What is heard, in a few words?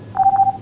Disk Inserted; LCARS Theme